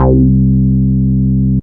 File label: OW BASS, OLD SYNTHS; Rubber Chicken Software Sample Bank